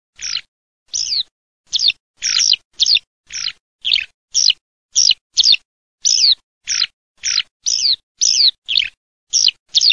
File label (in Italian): ALLODOLA